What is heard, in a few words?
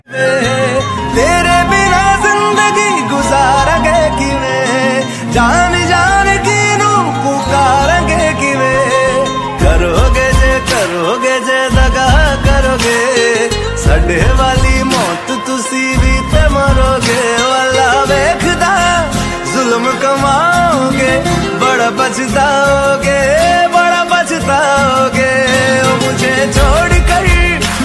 romantic ringtone, bollywood ringtones